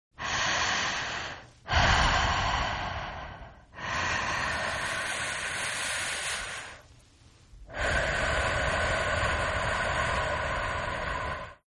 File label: Breath Slow Female; Partners In Rhyme